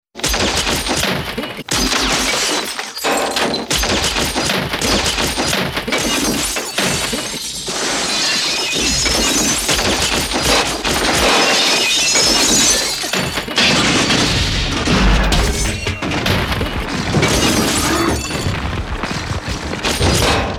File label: destruction seq